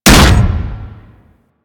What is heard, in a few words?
Hammer Impact Flesh E; Warframe; Weapons